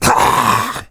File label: attack; slender; zombiebm